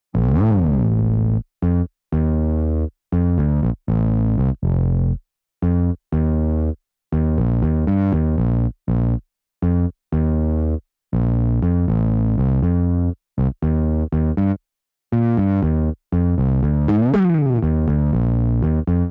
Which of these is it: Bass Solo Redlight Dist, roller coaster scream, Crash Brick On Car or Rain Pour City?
Bass Solo Redlight Dist